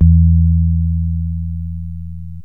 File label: sine bass, yamaha rm1x synth